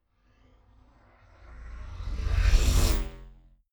sci-fi vehicle drive by buzz; raumschiff

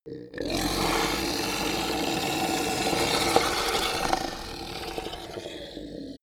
reptile choke long

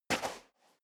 step boots sand single right

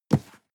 cardboard box medium grab slide hard; objekte

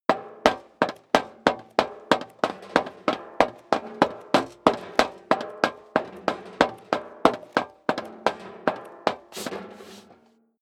step leather shoes metal rattle run sequence; schritte

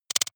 noise clicks; maschinen; signale; soundpool

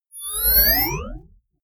screen turn on whoosh heavy; energie

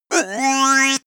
giggle tube up

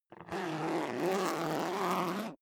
zipper down jacket open; objekte; reissverschluss

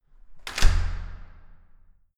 entrance door close hard

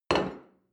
plate place table medium short, restaurant cafe; sport-freizeit

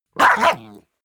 chihuahua growl bark; natur-tiere; hunde

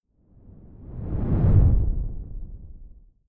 whoosh simple low grainy

